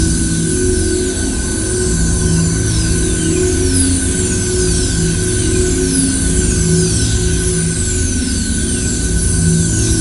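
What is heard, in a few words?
travel loop; smite; heimdallr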